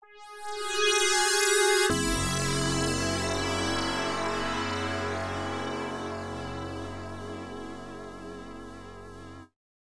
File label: Shock; eve burst error; opna pc 98 gamerip 1995